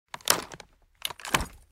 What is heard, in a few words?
m249 draw; mg43; tacz; RFP v1.0.0 beta2